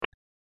Chip Pressed; My Cute Commissar

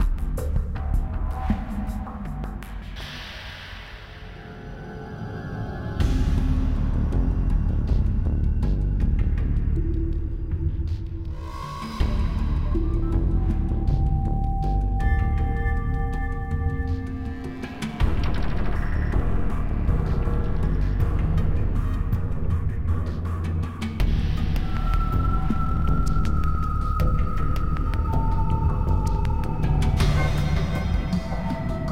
Sacrifice Ambient; modern combat 3 fallen nation; android

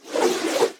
Warrior Whirlwind Whirl Single

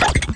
down test

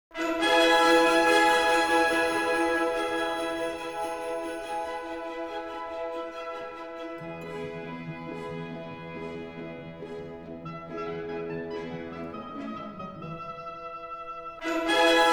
Loops; psyvariar 2 the will to fabricate; naomi; dreamcast

